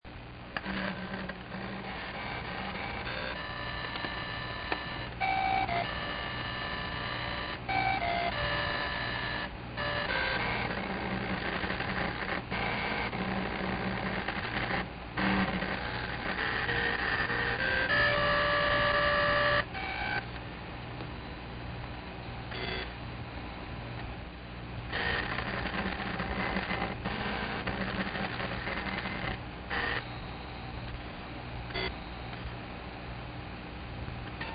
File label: Drive Music Sound